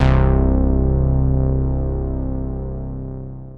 BASS Aversion (C); Melodic One Shots; Cymatics; ANALOG EVOLUTION Beta

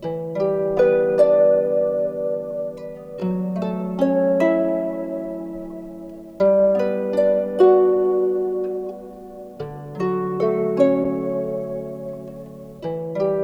Harp Loop Wet 75 BPM B Min; Melodics; Cymatics - Eternity Sample Pack

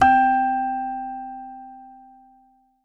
BELL Toy (C), Melodic One Shots; Cymatics; ANALOG EVOLUTION Beta

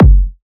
Kick, Techno, One-Shots, Drums; Ghosthack; Techno Sounds 2025

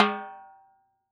Timbal, World Percussion; Drum Samples; Cymatics; PHARAOH Beta Pack; Pharaoh Premium Drum Samples (BETA)

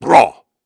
Grunts and Chants, Viking Vocal

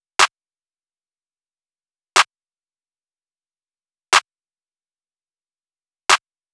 Drum Loop 122 BPM Clap; Cymatics; Vibes II Drum Loop Collection; Connection Drum Loop

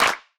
Clap; Cymatics; Light Free Sample Pack; Drum One Shots

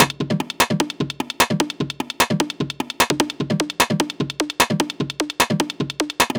Perc Loop, 150; Drum; Ghosthack; Drum Mega Bundle Demo; Drum Hero 5

